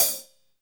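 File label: HH, hi-hat; Sound & Vision - Gigapack I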